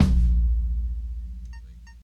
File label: tom one shot a sharp key, Loudest frequency: 176Hz; tom drum